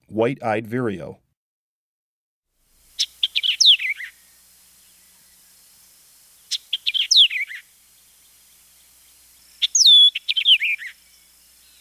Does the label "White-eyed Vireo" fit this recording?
Yes